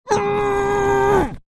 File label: human male scream gagged; zapsplat